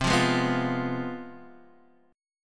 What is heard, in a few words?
C Major 7th Suspended 4th Sharp 5th, C, down, Guitar, Open D